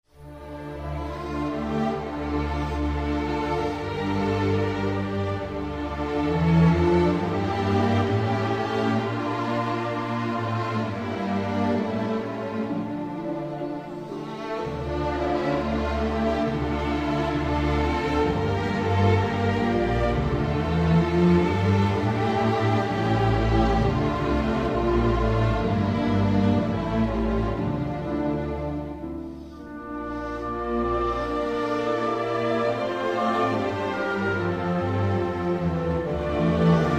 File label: Hatikva orchestra; israel; anthem